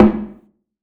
Snare Skin Only

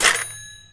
cash register; Game Maker 8

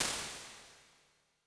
Plates Digital Rich Plate R; Impulse Responses; Ableton Live 12 Lite

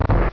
Door Close; Open Zelda; Quest Designer 2.0.4b

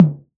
Tom 808 Mid DMX; Drums

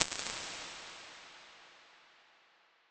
Hybrid Halls Big Clear, Impulse Responses; Ableton Live 12 Lite

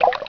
WATER; Canon CameraWindow

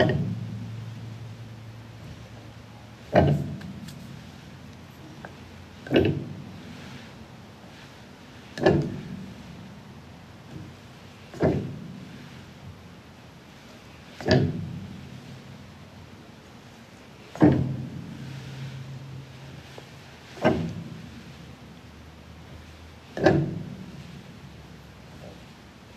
Upright Home Piano Keys Clacking Noises; Multisamples; Ableton Live 12 Lite; Core Library

